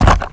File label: flip transition up; Tony Hawk's Project 8; XBOX